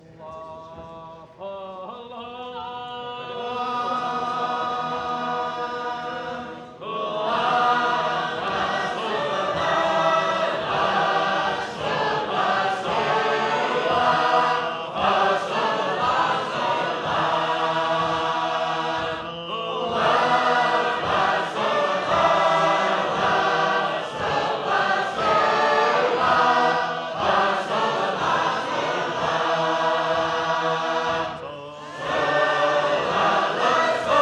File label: Sacred Harp; Messiah